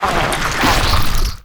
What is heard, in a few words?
Quadrapus Death